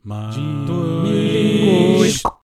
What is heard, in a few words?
barber quartet